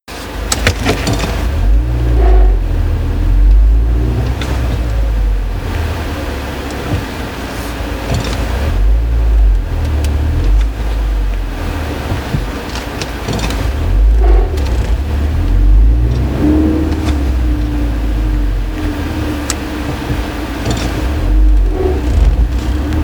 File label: ford focus ST squeak